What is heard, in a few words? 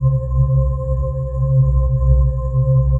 SYNTH D+B 160 C, Drum+Bass, Keys; eJay